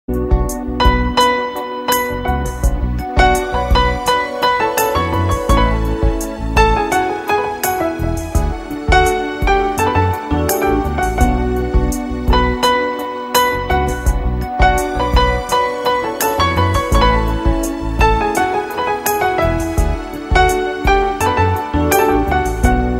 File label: Music Melody